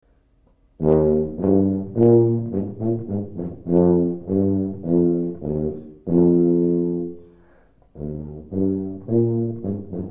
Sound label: tuba